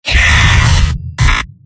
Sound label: heavy pain severe; mvm